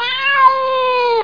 cat

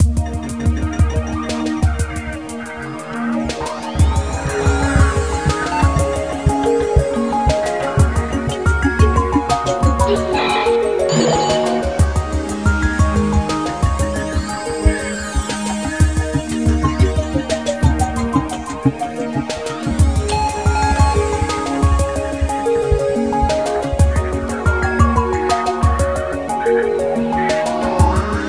Mellow

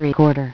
recorder; Wired for Sound Pro CD; Aristosoft, Inc.; icons

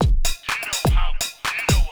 DRUMLOOP HOUSE 125; eJay

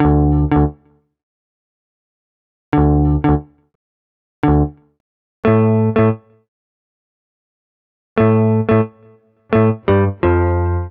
E. Gtr Hit; maudio; Hella Bumps

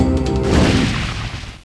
f firebal

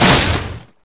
droid hit; DroidWorks demo

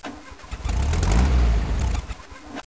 putt putt

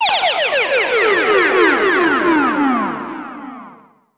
Alien Twirl; Let's Discover Massachusetts; Time Warp

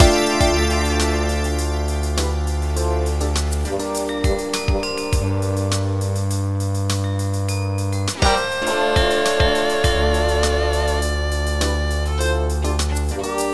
FUNKY

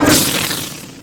PLASMA; StarSiege

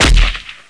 tranq, weapon; Dino Crisis

